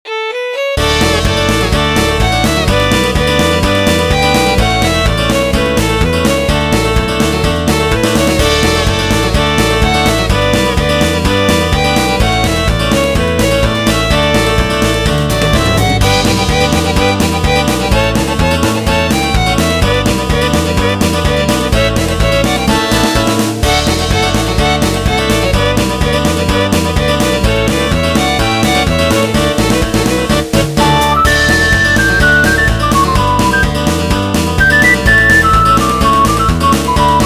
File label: BGM COUNTRY